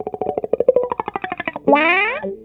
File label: LITTLE WAH, Funk Gtr & Wah; E-MU Producer Series Vol. 8 – Platinum Phatt